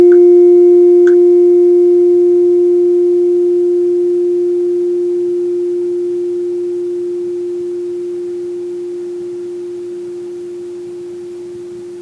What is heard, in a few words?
bladder, tuning forks, human organ unweighted